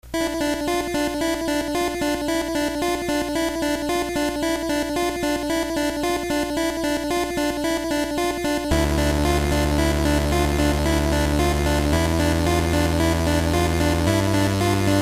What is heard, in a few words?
suspense; gba doom